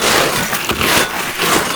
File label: icepick scrape loop; Modern Warfare